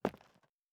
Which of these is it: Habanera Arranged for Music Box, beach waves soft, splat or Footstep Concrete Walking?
Footstep Concrete Walking